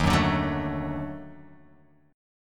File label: D sharp Minor Major 7th Flat 5th D sharp Guitar Open F; strummed